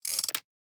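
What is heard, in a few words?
atk military crossbow reload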